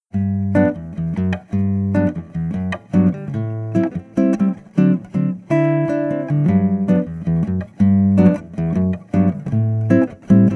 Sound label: nylon fishman